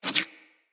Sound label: Root Spider Web Shot Proj Whiz; Animals; Spiders; Abilities